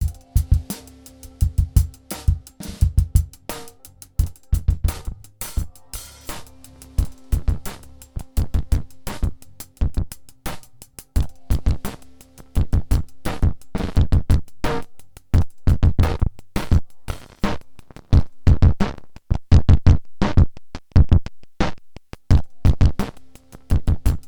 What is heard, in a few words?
Erica Fusion Plasma Drive Drums Dry Wet Sweep Voltage Minimum